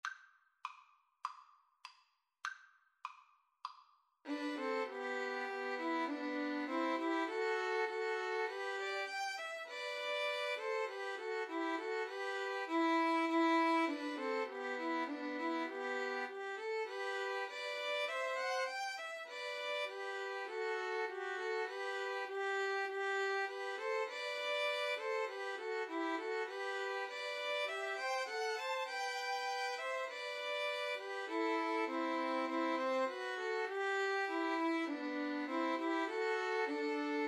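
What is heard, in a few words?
christmas eve 2 Violins Cello karaoke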